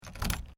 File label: Car: Key Into Ignition, Interior; Cars; Vehicles